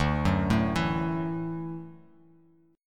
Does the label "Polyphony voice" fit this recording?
No